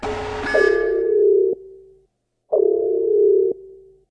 blue alert; civ13; civ14

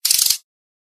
ratchet

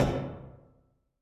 hull, Footsteps

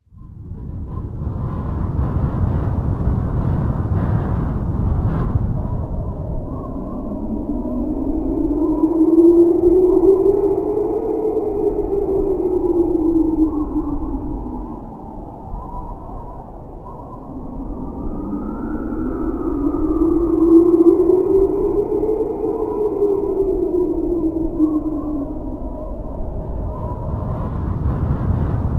winter; Civ, Ambience

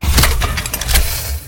gun mortar unpack; RMC

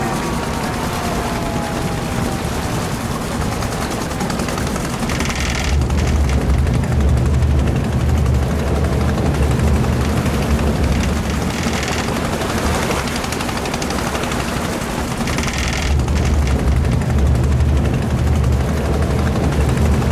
landing craft, vehicles

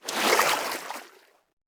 wade, Footsteps, Water

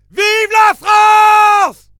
charge, Voices Emotes, fr

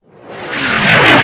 new artillery incoming, Cannons; Weapons; Explosives

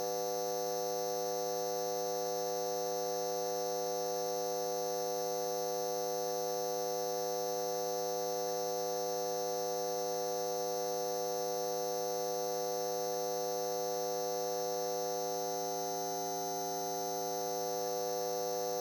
light hum; Ambience

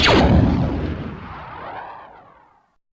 blaster e5 sniper, Guns Fire

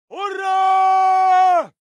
charge, Voices Emotes, ru

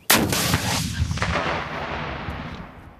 rpg7, Weapons Fire; Guns

